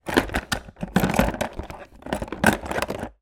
industrial tool box small plastic few tools inside rummage